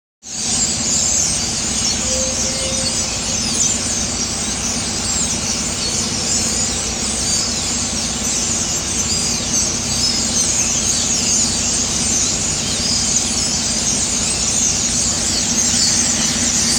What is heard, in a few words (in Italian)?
verso storni